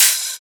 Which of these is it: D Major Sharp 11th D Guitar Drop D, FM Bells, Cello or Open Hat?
Open Hat